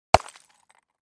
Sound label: Clay Chimney Vent Hit, concrete & brick